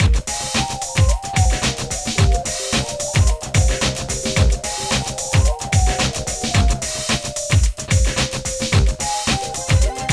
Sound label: Disco; sheep